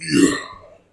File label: claw